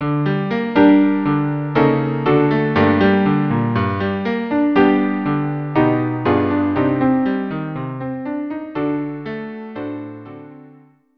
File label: cello Piano; Hevenu